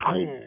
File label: die; The Elder Scrolls Travels Shadowkey; Nokia; N-GAGE